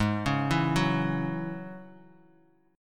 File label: G sharp 7th Suspended 4th Sharp 5th G sharp, Bass Standard; G#7sus4#5 arpeggiated; JGuitar